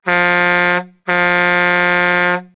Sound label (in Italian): clacson; rumori